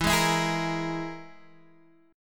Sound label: E Major Flat 5th E, Guitar, Drop D; chord shape